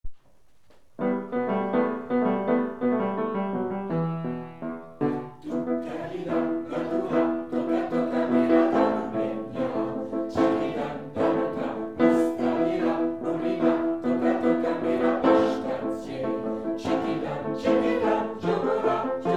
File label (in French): chant piano